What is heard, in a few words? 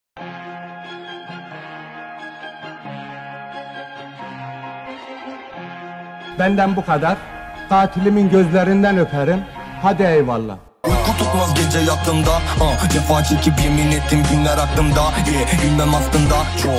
remix